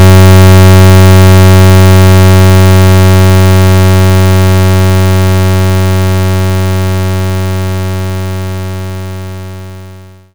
Pulse 93 75Hz

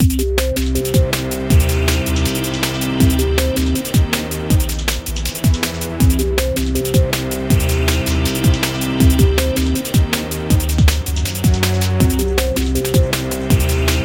Space DnB

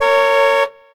Kiosk Vehicle Honk; Zombie Catchers

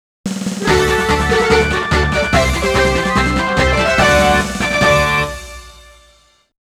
All Stage Clear

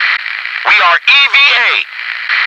noice

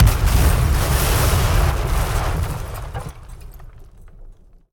String; The Bureau XCOM Declassified

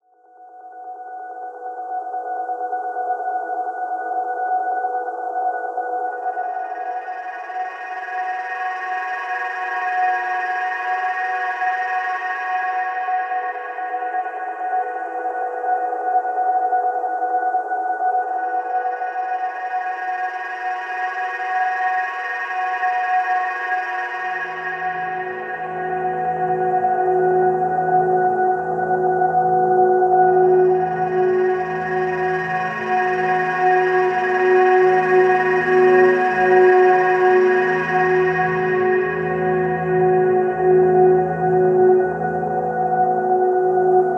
Granular Ring; Flesh Blood Concrete

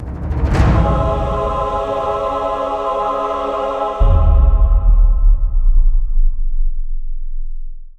Fawn dirge; Blackfaun

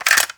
Cassette Rattle

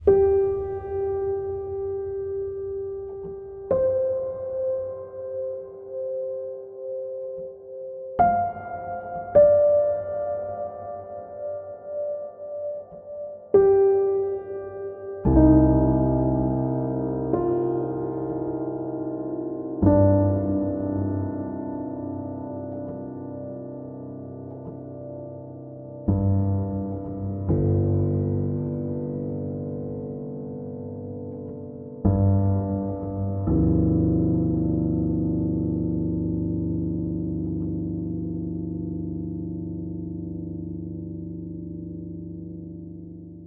Ambient Piano; kholat gamerip 2015

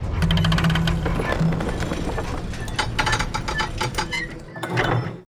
piano opening

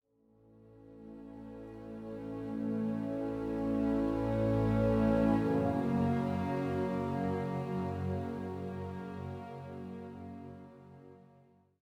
85bpm; Legends of Pegasus; windows gamerip 2012

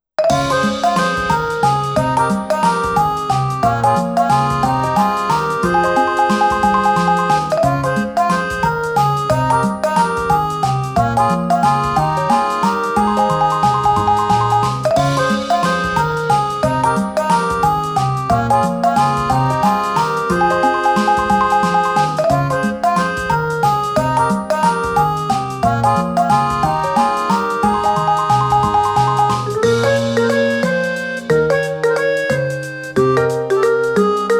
Bgm Main; chronus c inc development; neko neko island cute nyanko and enjoyable city creation; android

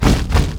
stomp; hero grawl

